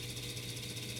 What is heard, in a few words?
floppy sound fdd5 motor loop